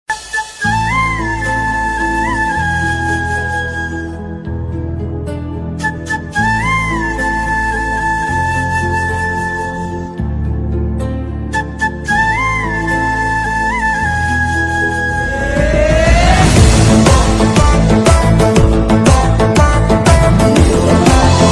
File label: movie bgm ringtone telugu bgm ringtones; pelli sandad